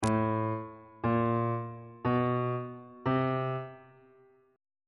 a 2nd interval pitches on bass clef

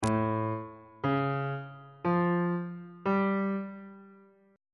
a augmented 7th chord intervals on bass clef

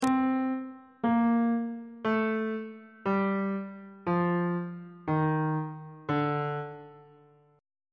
d phrygian mode descending

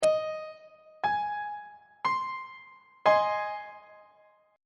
g sharp major 2nd inversion on treble clef

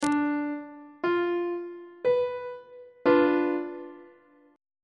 c flat diminished 1st inversion on treble clef

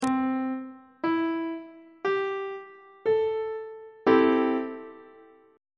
d double flat major 6th chord on treble clef

